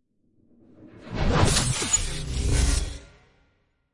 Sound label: Storms Meteor; Gothic Storm Music; Advanced Sound Design 2012